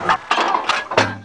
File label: reload medium shell; emplacement